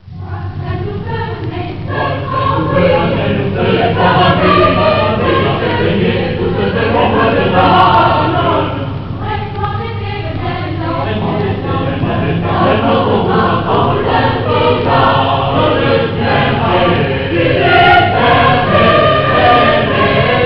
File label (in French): chant du Nord; orgue cath oran